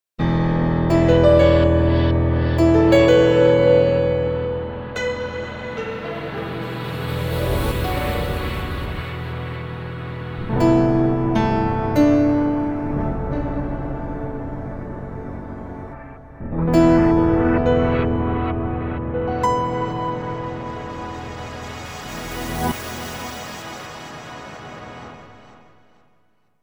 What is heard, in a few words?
Piano Asylum; Montage